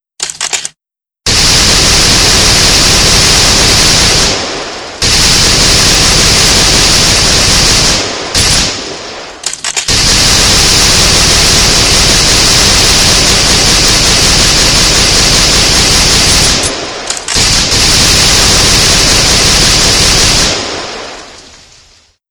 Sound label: gun